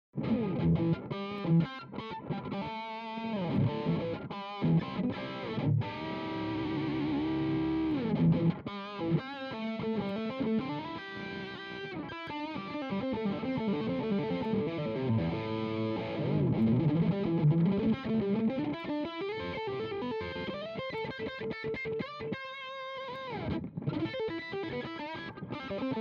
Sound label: both dry, micing amps